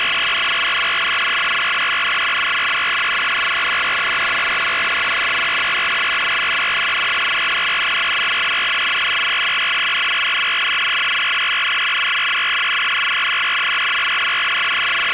usb

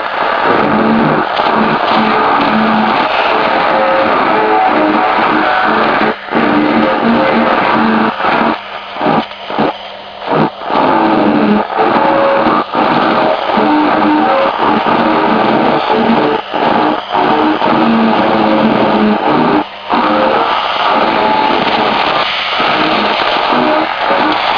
8707 kHz AM

